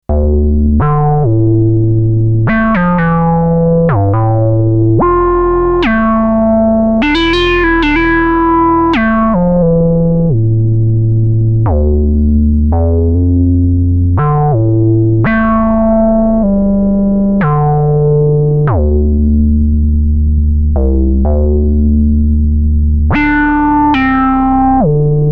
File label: Behringer Model D Patch